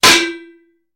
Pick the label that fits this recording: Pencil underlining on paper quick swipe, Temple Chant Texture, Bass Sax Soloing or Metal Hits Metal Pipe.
Metal Hits Metal Pipe